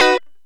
Lounge Guitar Chick Major B2; Zero-G Kocktail Kollection

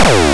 vibro; Nasta Hit Stix2

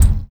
Hi Hat, drums; Sonic Foundry (Sony Creative Software); Drums & Drones; Construction Kits; Sludge Kit

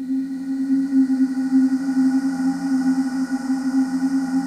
HOLLOW BLOW; Pro-Rec - Synthsonic

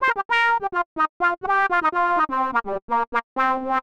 125 Fluty Lead C; Keys; PowerFX - Nu Jazz House

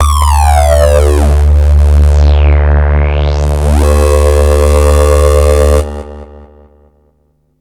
Korg Prophecy hits, arps and fx

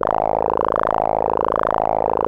700 SYN C 1, Korg; Back In Time Records; Korg Universe VOL-1

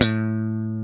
GTR Funky Strat, Funk & Mute; Club-50 - Foundations

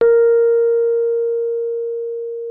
MK1 A#4, RHODES; Xsample VOL-1

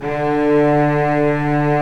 String Master Series

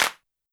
Hand Clap Small; YAMAHA RM1X

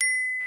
GLCKN

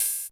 hi-hat, Millenium MPS-400

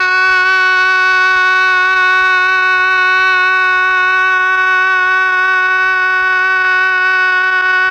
OBOE; ILIO; Propeller Island Legendary M400

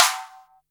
Snare, Boss DR-660, Drum Machines

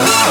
synth a, Rave Synths; Dance Synths

